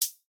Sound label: Hi Hat Closed